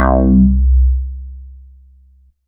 C2, Synth Line; 90 s Sample CDs; NiceBeats; SynthLine VOL-18

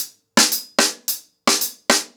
110BPM, ACOUSTIC DRUMS

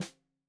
Snare 65 Reg Stereo, Deeply Sampled Drums

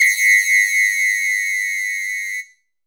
whistle hi, samba whistle; Brazil

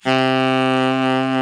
TENOR SAX C 48; Northstar - New Gold VOL-1

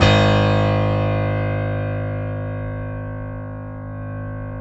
PIA GRDPI, Roland

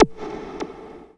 Synth Sound; VEE Synth Shots

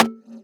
Percussions

